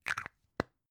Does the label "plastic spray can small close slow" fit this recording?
Yes